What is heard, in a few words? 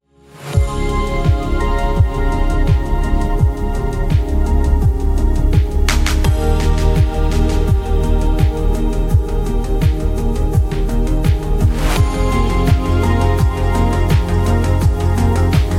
80s Ambient Music; atmosphere music